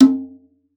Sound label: CONGA, BONGOS-CONGA; Best Service ProSamples vol.42 - Session Instruments